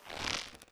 pull, weapons

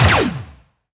zapgun fire; weapons

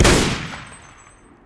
mp40 shoot; weapons

